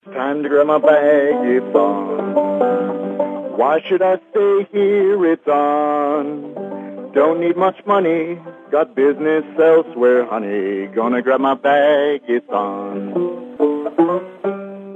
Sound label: Banjo Ringtone